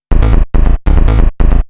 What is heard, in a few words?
TECHNO BASS 140 A